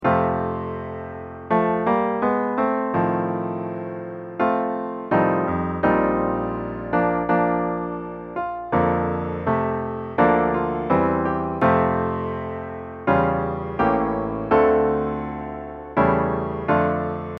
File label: Piano